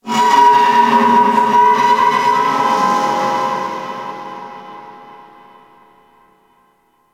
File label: Corpus Infested Distant Metal Groan; Warframe; Ambience